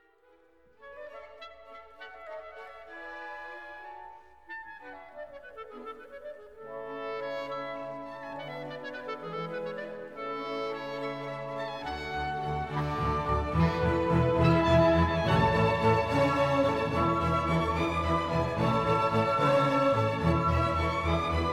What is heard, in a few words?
Clarinet Con A